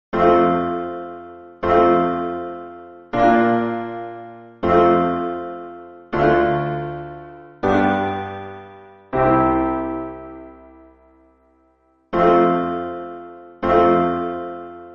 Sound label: right hand chords, piano; Amazing Grace